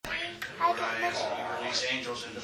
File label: demon growl short